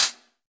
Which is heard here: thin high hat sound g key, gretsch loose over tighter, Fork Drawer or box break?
thin high hat sound g key